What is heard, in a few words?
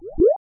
button